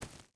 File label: footstep barefoot gravel run; Rust